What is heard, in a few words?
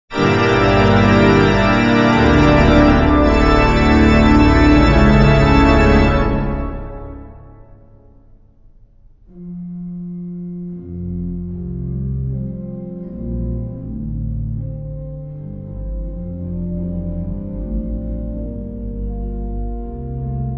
chaconne